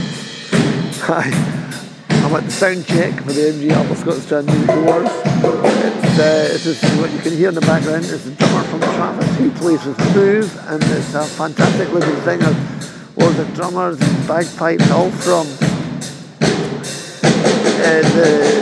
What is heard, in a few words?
sound checking, Scots trad music; mg alba scots trad music awards